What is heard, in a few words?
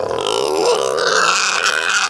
pig die